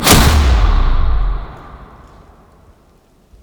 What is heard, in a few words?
Ranger Aftertouch Kill; Warframe